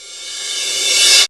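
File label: reverse cymbal; E-MU Producer Series Vol. 8 – Platinum Phatt; Best of Phatt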